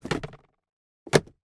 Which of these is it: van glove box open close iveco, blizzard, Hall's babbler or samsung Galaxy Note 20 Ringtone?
van glove box open close iveco